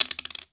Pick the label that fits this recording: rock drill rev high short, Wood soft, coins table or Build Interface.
coins table